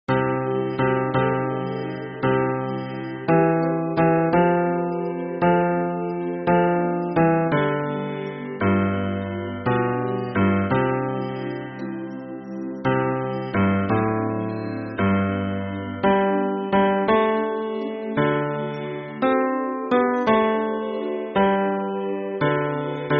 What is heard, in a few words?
hymn bass